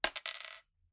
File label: Coin Drop Concrete Floor